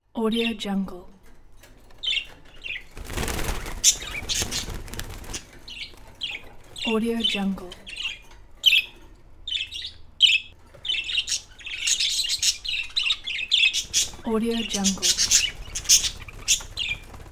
birds in a cage

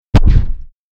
silencer dry

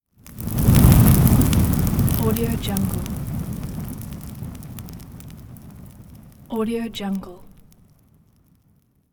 fire transition; glan-c

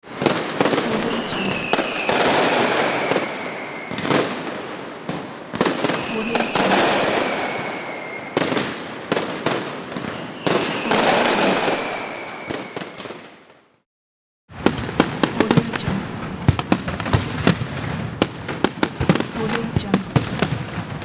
fireworks explosion

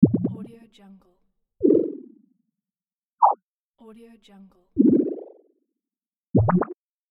water drop

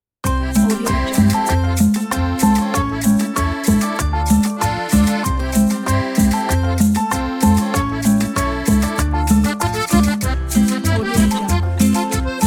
classic cumbia

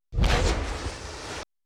thruster blast

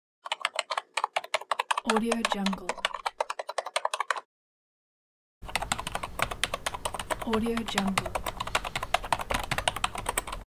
keyboard; alexsandal

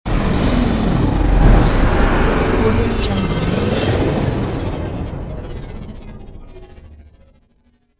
alien technology

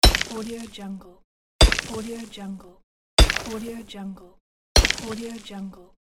mining

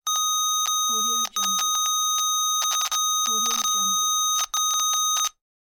slow morse code character

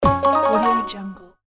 small success

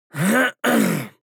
human vocal harrumph male mouth open

